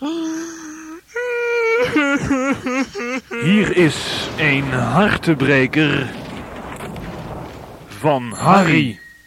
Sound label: Jingle; hartebreker van Harrie; Allerhande